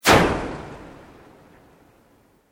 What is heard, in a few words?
Sweep Organic Fast